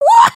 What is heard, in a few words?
celebrate, emotes